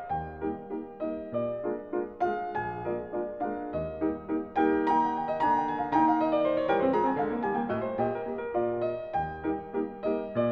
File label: Variations and fugue for piano in E flat major